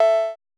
perc, Roland MC-909

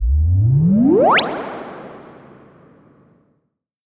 Simple OSC Spring Reverb; radiosonics; Classic Simple OSC FX

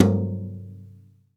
TOM XBRUSH, Brush Kit; Club-50 - Foundations Roland; Brush Kits

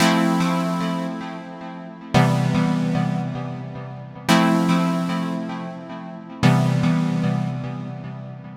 112 C Phase My Flange, Melodic Loops; Electronisounds

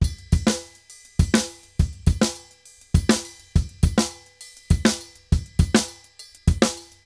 Drums 136, Festa Latina, Drums & Percussion; Best Service; Festa Latina VOL-1

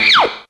TOON; Zero G; Man Machine

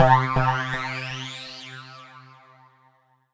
C3, Synth Line

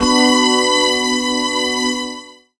Choir String, Pads; Zero-G Jungle Warfare 1, 2 & 3; 90 s Sample CDs

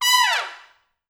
Pop Brass, B4; Best Service; ProSamples vol.19; AKAI

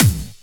hardbassdrumz, breakcore; Bazzdrumz

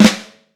FLAM, Vintage Snare Drum; 5.5X14; Q Up Arts; Johnny.C's Vintage Snare Drum

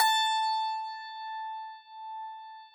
Harpsichord A3; Best Service ProSamples vol.53; Classical Prepared Pianos; Total Piano

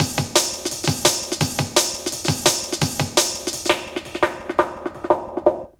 LOOP, Jungle

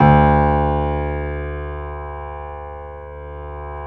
PIA GRS, PIA_GRAND, Roland; Sound & Vision; Gigapack I CD 2; PIA Grand 4

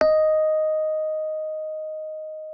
MK1F D#5, Rhodes; RHODES A; Xsample VOL-1

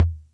Music Percussion Computer Tom, Drum Machines

